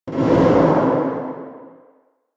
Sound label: Horror Explosive Impact